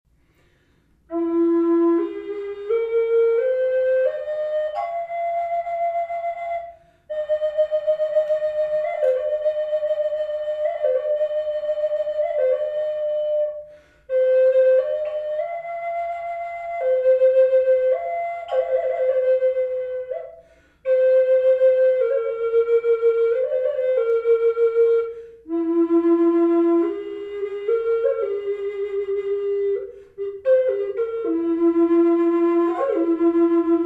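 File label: reverb, flute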